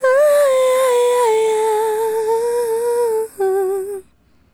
Adlib, Hip-Hop and RnB Vocals

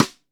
METAL, RIM SHOT

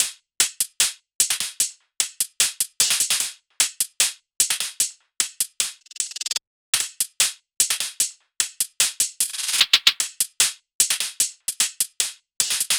loop hat 150; Seismic Loops

